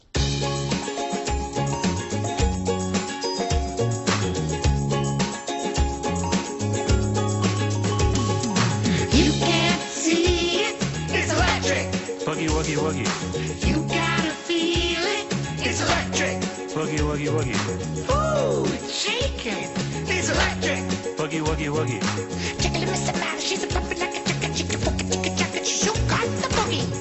Electric Boogy Woogy

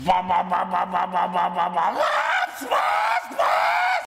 Drift

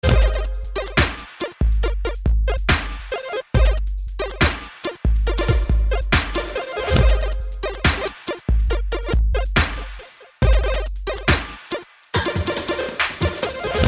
DubStep 8Bit Remix

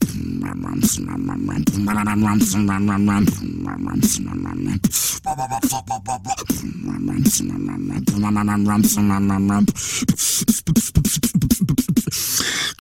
Sick dubstep

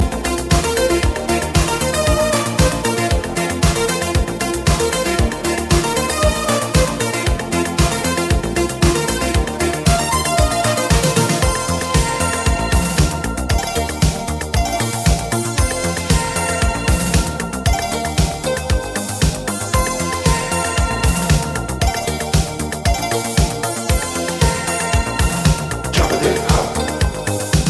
Koto; Jabdah

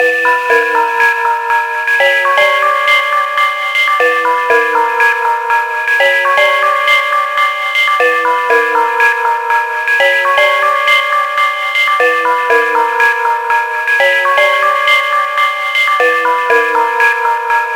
Windy Bulbs Ringtone

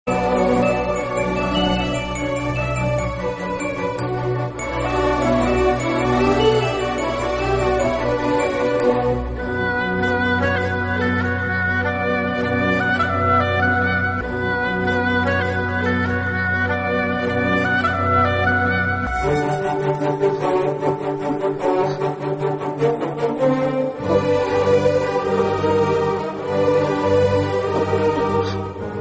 bgm

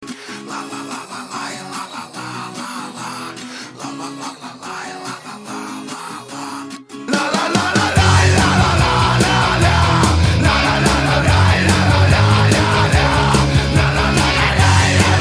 ditty; hip hop

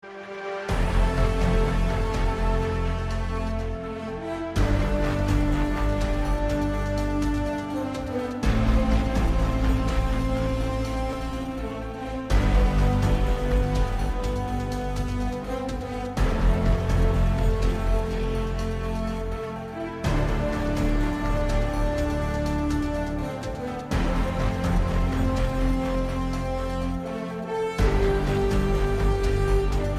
Lobby Music

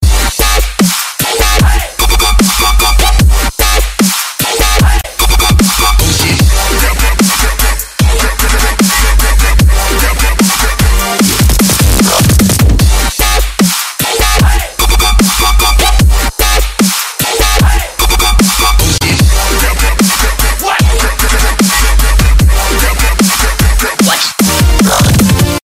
Heavy Dubstep Melody

